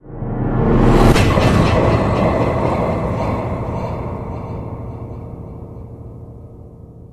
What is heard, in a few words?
Buildup Long; friday the 13th killer puzzle